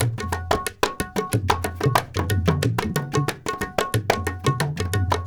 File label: India, Heart of Asia